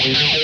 METAL STAB, Distortion Gtr; PlatinumPhattCD2; E-MU Producer Series Vol. 8 – Platinum Phatt

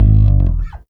REAL BASS, BASSLICKS; Ueberschall; Jam Box

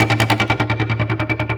LOOP FX, Drum & Bass, 150 BPM; Ueberschall; Drum & Bass Resonance

